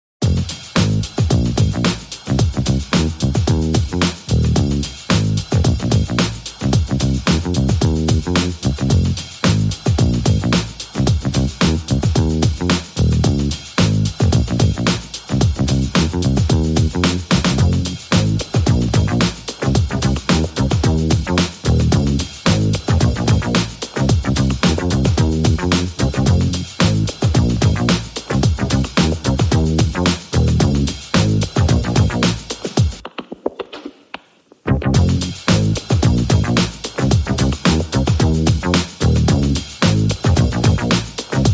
Drums and Bass

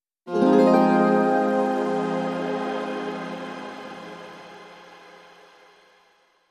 Sting

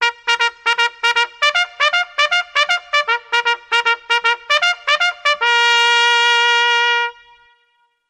Cavalry Charge, Solo Bugle Call Reverb

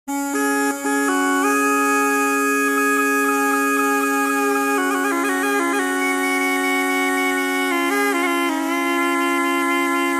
Pharaon Pipe

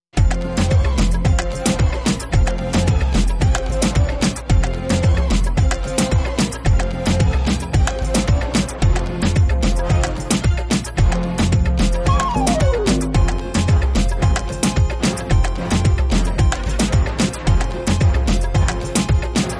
Oriental

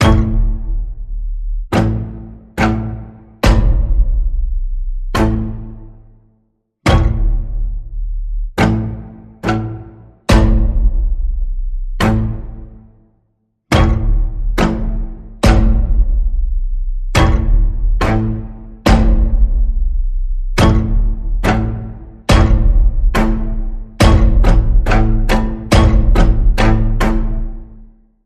String Pulse A, Key of A

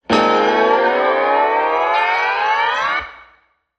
Trampoline

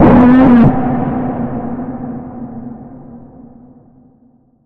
Bend, B

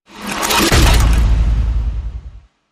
Vanishing Footsteps Ghost Hit

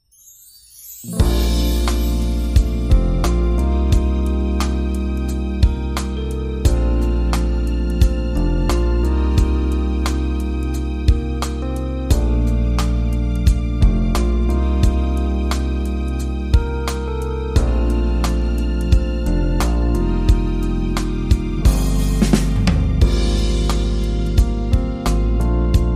Warm Piano

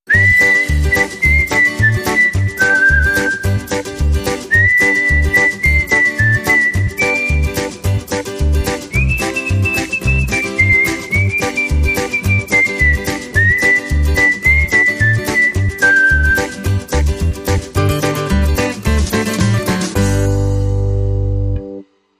Whistling

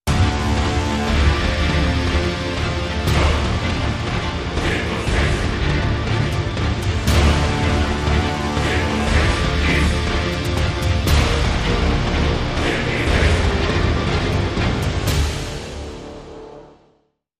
Link Sting

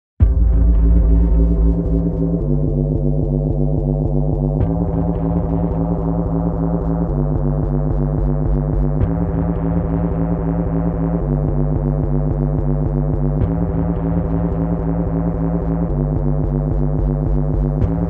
Lowdown

